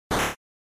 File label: GB RPG Attack